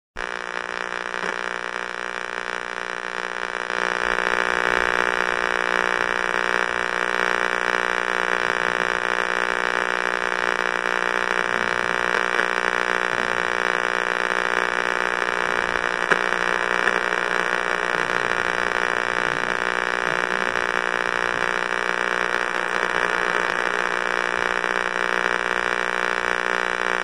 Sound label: Static; SOUND EFFECTS1